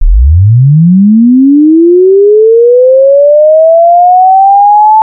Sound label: sweep